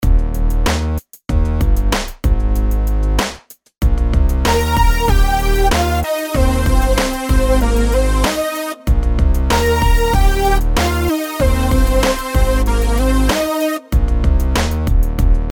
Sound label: melodies simple Ebmaj; melody